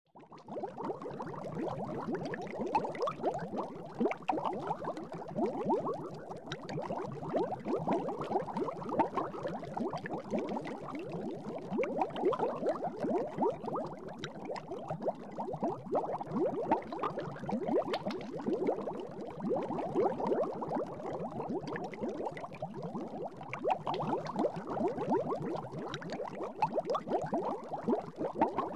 Water bubbling

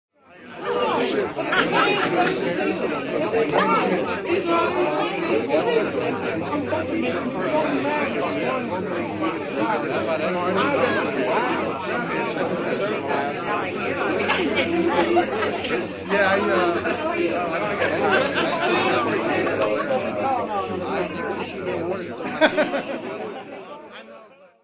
Conversations, laughter, small crowds

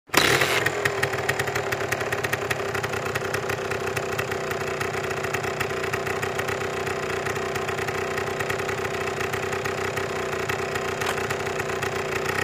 Motorcycle starts, waits, drives away

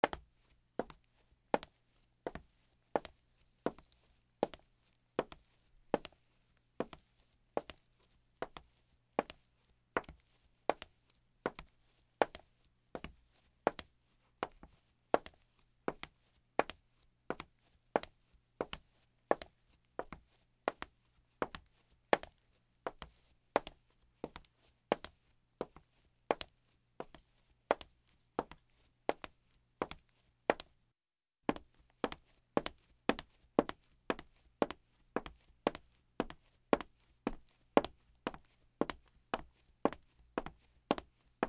Steps on the wood floor in shoes

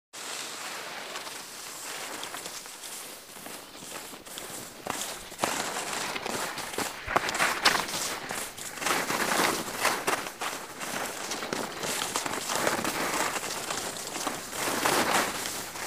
Skiing in the snow

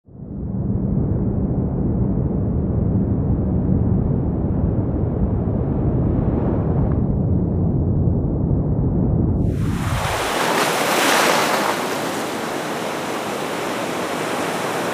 Submarine floats to the surface

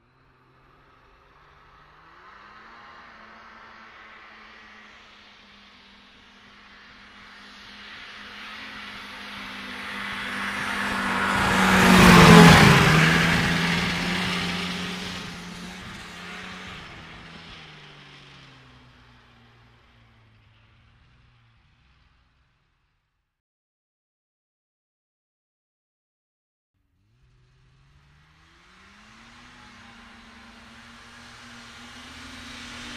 Snowmobile passing by

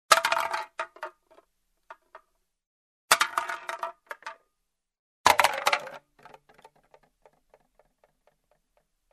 falling tin can; tableware

